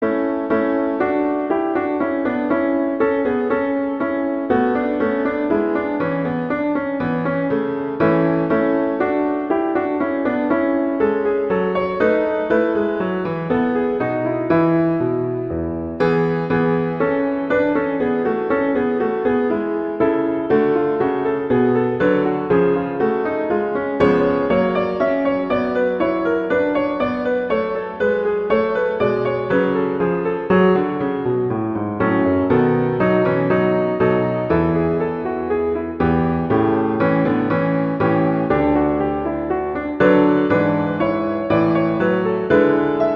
Bourree; Bach; Eng Suites; Suite II

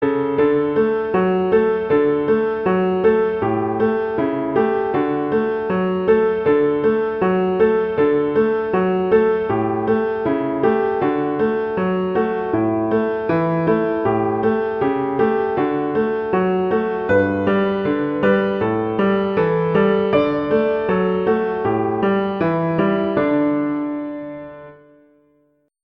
O come, little children, Christmas Beginners

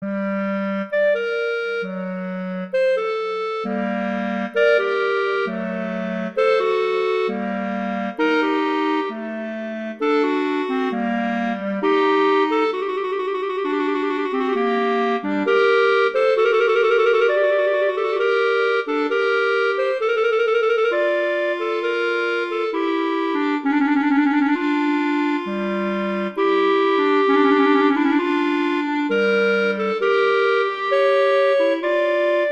Adagio; Telemann; Canonic; Sonata 1

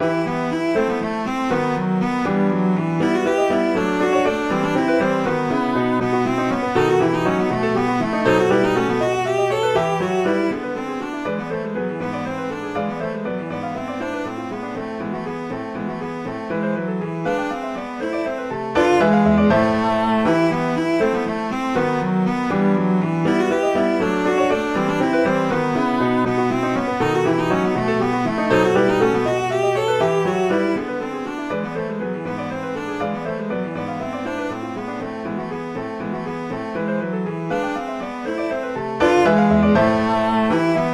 Allegro; Vivaldi; Op14 No5

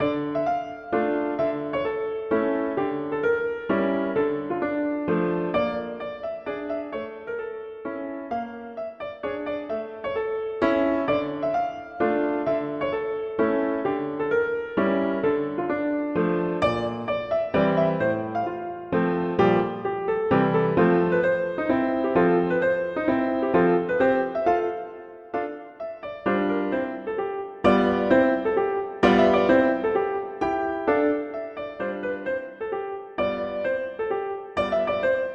Mazurka; Tchaikovsky

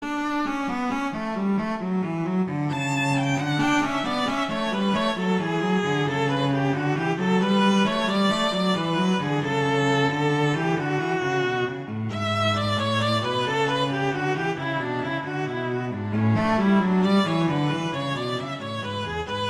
Gigue; Pachelbel; Canon; Vla

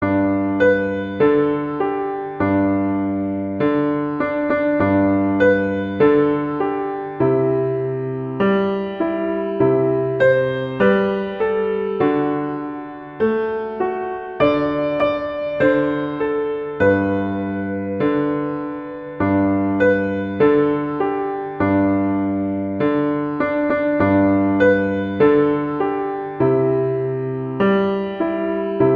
Jingle Bells; Christmas Beginners

